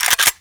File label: bolt pull, M41A; weapons; svencoop